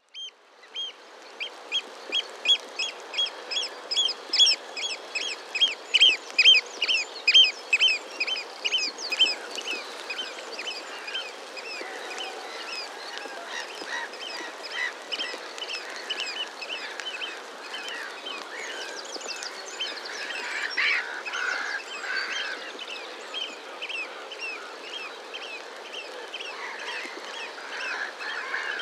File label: Stormy Day Salt Marsh; wild soundscape